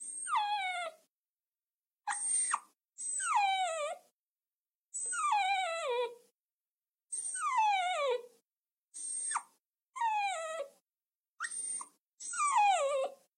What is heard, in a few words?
Dog Whining